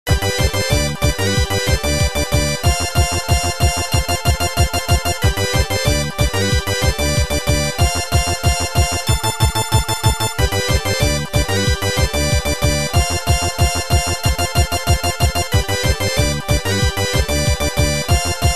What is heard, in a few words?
invincibility bgm; sonic3